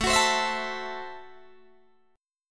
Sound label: A Minor 6th A Guitar Open D